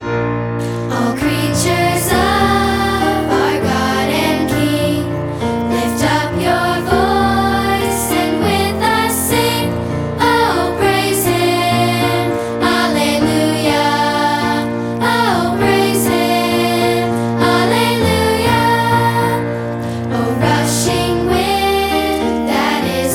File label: Piano